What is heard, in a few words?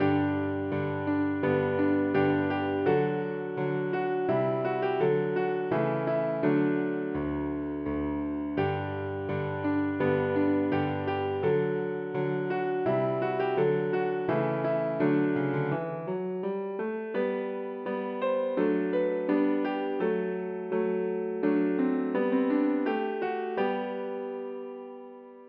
piano